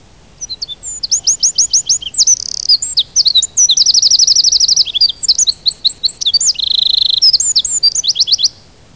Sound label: Troglodytes troglodytes